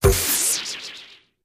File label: Synthy Hissy Ricoch